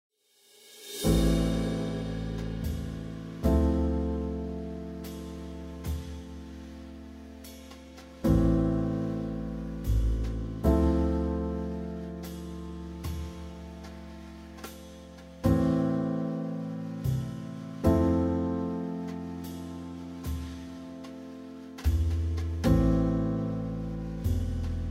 All White Keys II Lesson Music Accompaniment

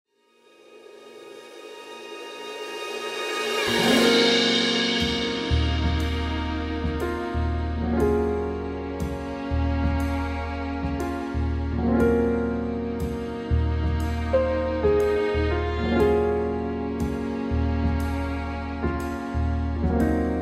All Black Keys Lesson Music Accompaniment

